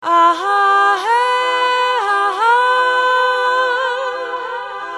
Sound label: Vocal Echoes